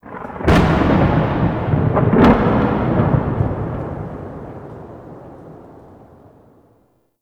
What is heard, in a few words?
Void Storm Thunder Clap; Warframe